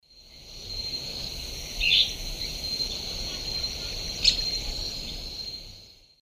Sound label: Myrmoborus leucophrys, call female; S. America; Eastern Lowlands